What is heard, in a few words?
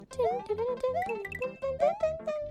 Singing; IRySinging